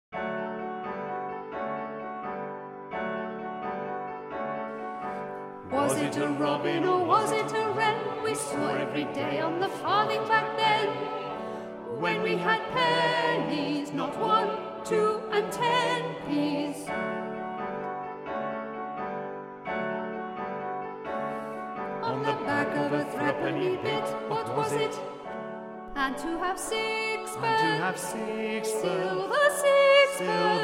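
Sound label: coins (soprano)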